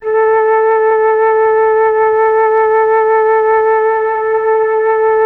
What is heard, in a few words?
MELLOTROF; Masterbits - Vintage Voltage; MELLOTRON FL